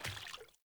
Sandals Walk Water, Footsteps; Characters; Player

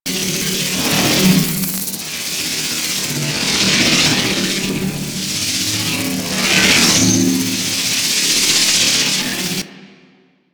Regrowth Electrical Loop; Props; Warframe; Ambience; Sentient Devourer

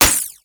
Sapient Pistol Mech; Warframe

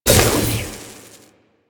Tentacle Proj Die; Warframe; Proto Infested Ancient; Abilities

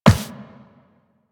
Walk Metal, Footsteps; Warframe; Operator

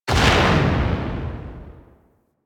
Hand Shotgun 2D Tail, Weapons

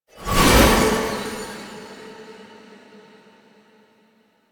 Horse Appear; Foley Actions; Warframe; Vehicles; Duviri

